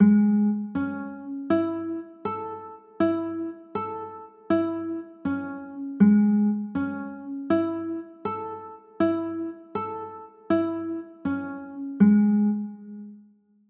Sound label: a maj7, chords